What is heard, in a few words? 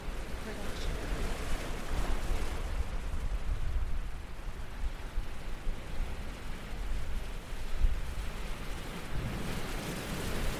loopable waves on rocks windy; soundscrate